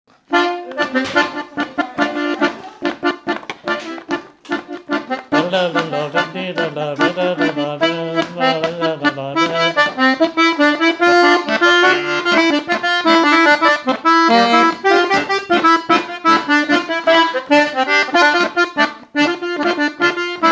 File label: Forro Accompagnement